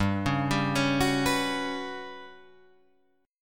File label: G Major 7th Flat 5th